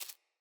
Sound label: Rat Step, Foley